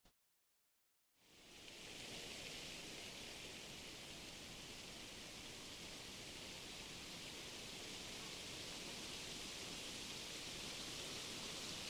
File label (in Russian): tihij veter v lesu v listjah; Звук ветра в лесу; Звук ветра в листве